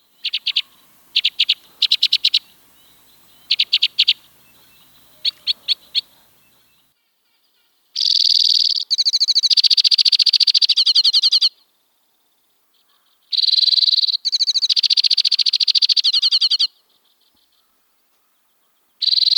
White winged Crossbill

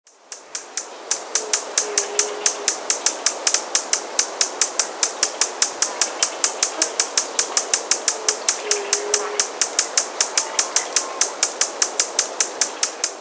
Buff bellied Hummingbird

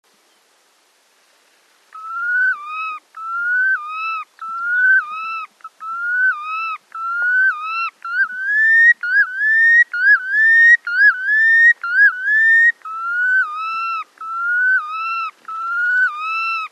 Red Knot

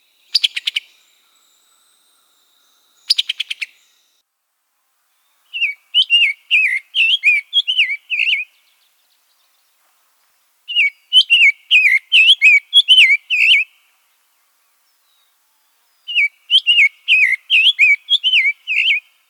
Summer Tanager

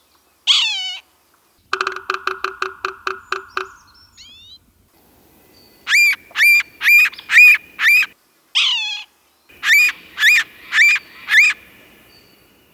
Red naped Sapsucker

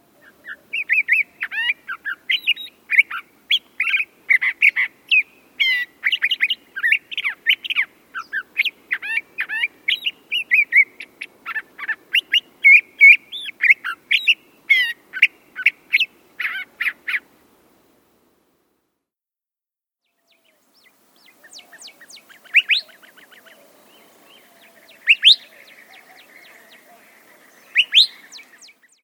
Curve billed Thrasher

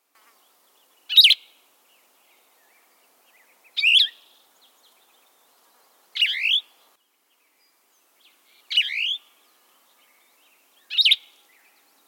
Plumbeous Vireo

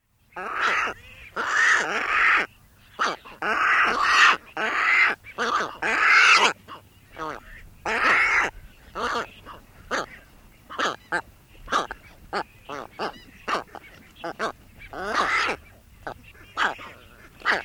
Brown Pelican

